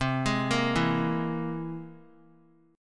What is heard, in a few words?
C Augmented 7th C down 3C, 3F, 3A, 3D; arpeggiated; JGuitar